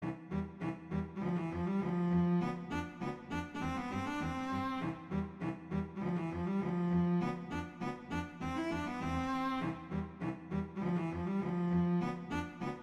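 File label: Duet, Allegretto, two cellos; Mazas; Duets; Duet 9; Danse Negre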